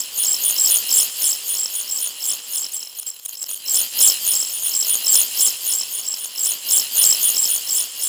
Ambient Tech Drone; Warframe; Tau; Ambience

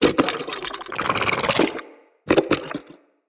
Shrimp Idle, Creatures; Vocals; Baliq Shrimp Glade

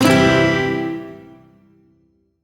Shawzin Maj Chord; Warframe; Duviri

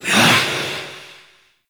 Vocal Melee; Reaper; Warframe; Wraith

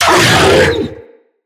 Infested Juggernaut Vocal Pain; Vocals

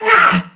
Pain, Crewmen; Dialog; Barks; Battle; Warframe; CorpusCrewmen; CrewmanD

